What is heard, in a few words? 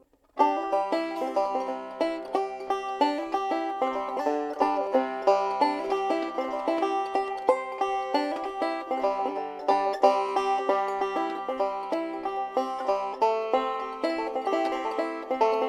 E pick, neck wood